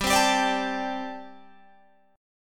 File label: G Suspended 2nd Suspended 4th G, Guitar, Open G